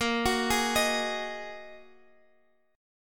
A sharp 7th Suspended 4th Sharp 5th A sharp, Guitar, Standard; arpeggiated; x,x,8,11,9,11; capo=2